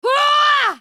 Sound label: spellcast effort; Kennen; Character Voices - English